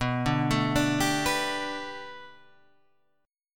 B Minor 7th Sharp 5th B Guitar Open G, chord

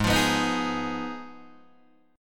Ab Minor 6th, Ab, Guitar, Open D

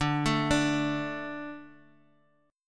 D 5th, Guitar Drop D

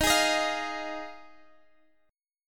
F Minor 7th D sharp

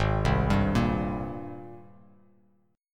Ab Major 9th Ab down Bass Standard; AbM9; arpeggiated

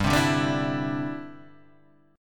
D 7th Suspended 2nd Suspended 4th, G

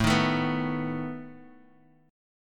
A Suspended 2nd Flat 5th A down Guitar Open D

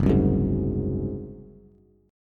Gb Suspended 2nd Flat 5th Bass; Gbsus2b5 strummed

